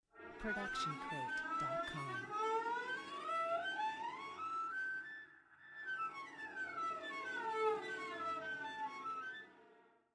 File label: Cello Slide Up Down; SoundsCrate